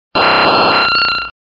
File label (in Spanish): Grito de Aerodactyl